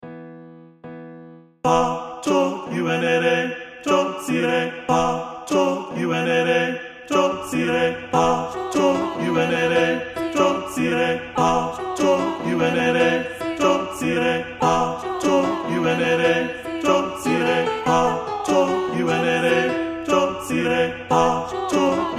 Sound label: Tenor & Bass; Segundo; Tres Cantos; NNMP Area Honor Choir